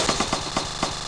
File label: stop; Funhouse of Love